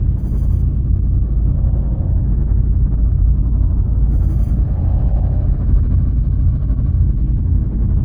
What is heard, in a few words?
ancient space station idle; Stellaris; guardians